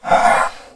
battlemage attack; megaglest

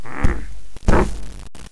mummy attack; megaglest

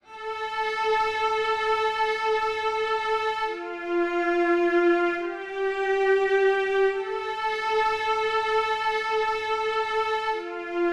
song

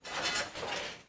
machine walk; megaglest

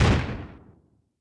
airship hit; megaglest

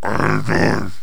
mummy ack; megaglest

